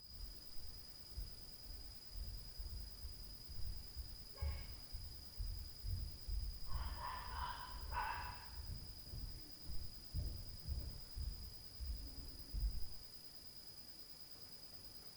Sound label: DOG, Night Noise